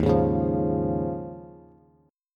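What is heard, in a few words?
E flat 7th Suspended 2nd Suspended 4th, Bass; strummed; chord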